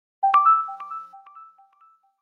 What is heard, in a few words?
Calendar alert, Nokia Alert tones; 6350